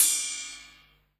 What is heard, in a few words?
RIDE, 180 BPM; Ueberschall; Drum & Bass Resonance